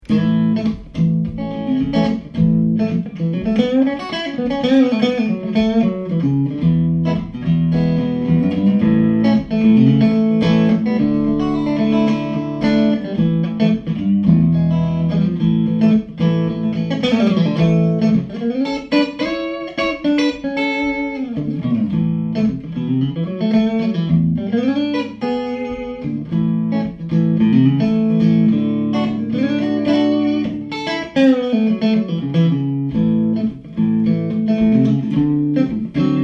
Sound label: alembic series i custom, electric guitars, solid body